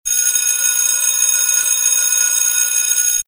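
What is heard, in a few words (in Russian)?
школьный звонок звук школьного звонка на перемену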